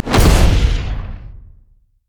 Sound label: dragons descent; LoL; shyvana